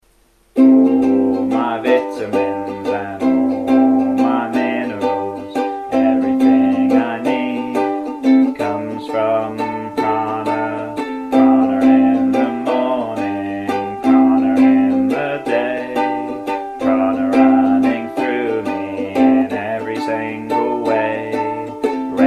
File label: The Prana Song; Edited - Urine line removed